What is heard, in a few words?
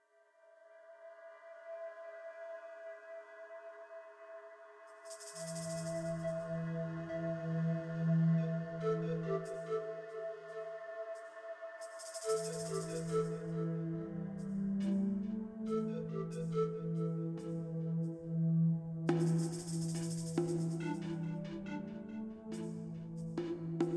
Music Alaska ambient